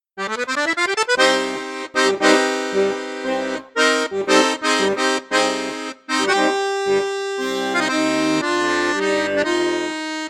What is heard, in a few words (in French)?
registre basson flute juste, accordeon; mengascini mini retro